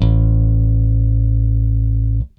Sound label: BASS, ROCK POP; MAGIX